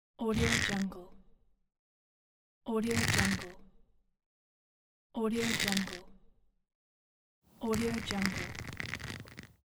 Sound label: pulling rope